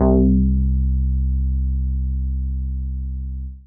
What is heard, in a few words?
Bass